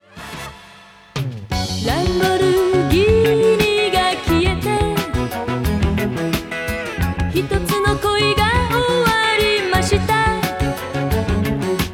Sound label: idol